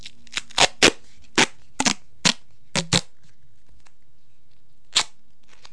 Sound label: tape